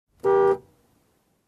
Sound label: Car Horn from inside